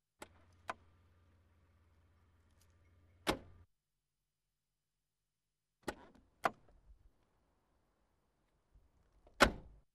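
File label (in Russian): заходят выходят из машины хлопая дверьми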